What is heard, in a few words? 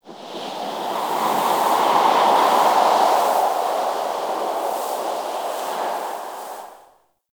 Wind Gust Low, Venus Landscape; Warframe; Venus Open World; Ambience